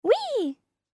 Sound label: Fire Sound; DoughnutQuest